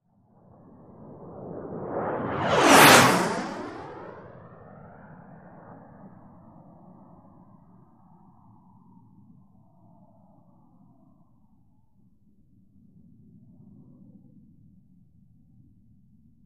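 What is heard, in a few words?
Gnat By Low Fast Cruise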